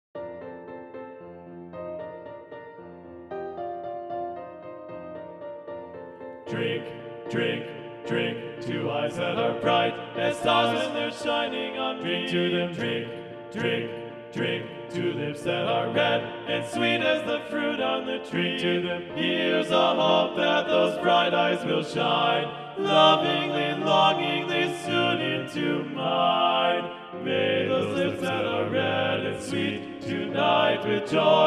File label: Drinking Song TTBB - Balanced Voices